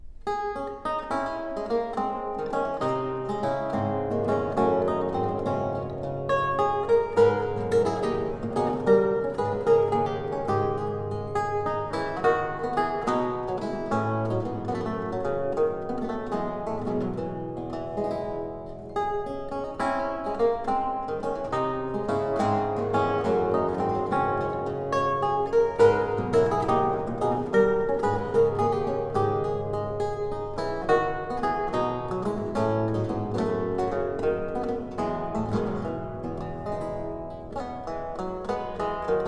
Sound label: Gigue, Lute Music